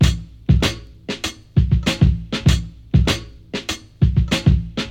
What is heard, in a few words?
98 bpm drum loop b key, Free breakbeat sample - kick tuned to the B note. Loudest frequency: 1032Hz; drum loops